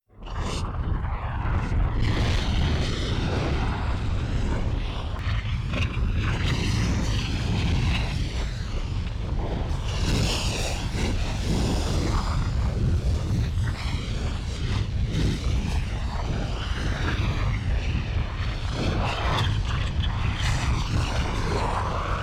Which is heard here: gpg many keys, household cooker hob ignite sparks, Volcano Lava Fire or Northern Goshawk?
Volcano Lava Fire